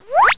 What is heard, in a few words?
ZIP; Bible Fun Pack